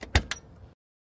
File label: Vw Bug Open Door Sprin; trunks & hoods